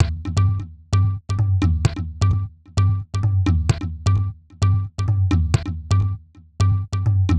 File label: Tabla Loop 130; Equipped Music